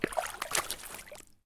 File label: swamp turn; Escape from Tarkov